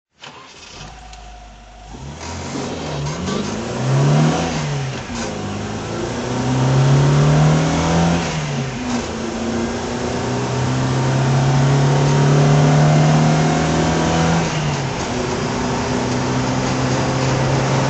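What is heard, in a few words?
volvo 142 drive, engine sound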